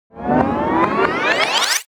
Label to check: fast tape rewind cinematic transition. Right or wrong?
Right